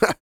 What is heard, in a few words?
LAUGH, Vocal Jazz Fx